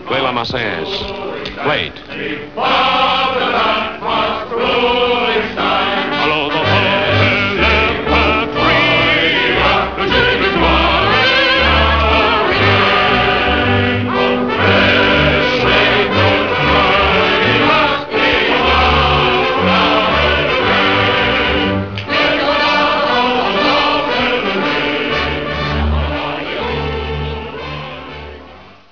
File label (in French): marseillaise